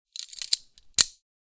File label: Prisoner handcuffs latch opening lever release click